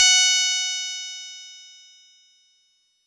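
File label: Fuzz Clav DX100 F#0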